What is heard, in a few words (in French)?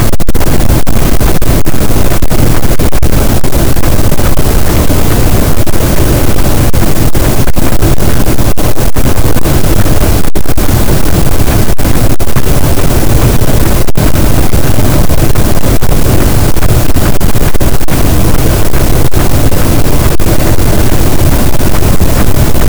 cachalot, gaussian noise; Physeter Macrocephalus